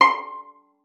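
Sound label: violin pizzicato C5; Xsample VOL-5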